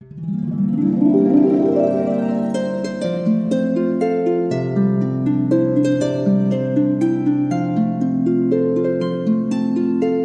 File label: Harp Happy Birthday